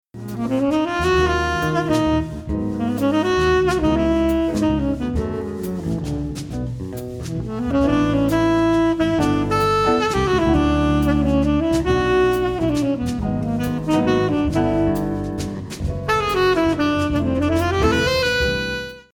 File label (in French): extrait contemp jazz